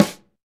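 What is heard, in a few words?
SD, snare drum; Real-Kit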